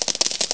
COINS IN

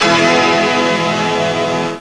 end note

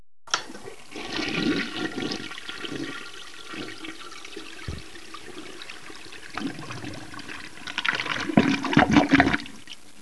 FLUSH

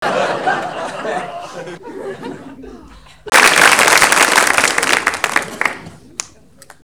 laughs; SOUND FX

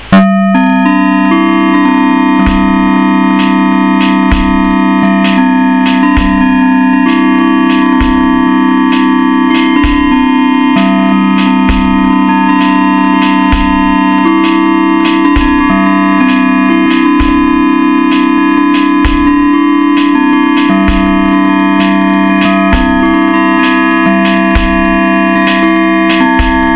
BELLS

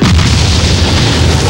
BOOM; INTENSE

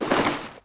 sliding; BRONX

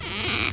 door

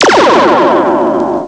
GOOP

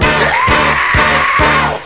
YEAAHH

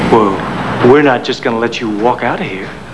WALK

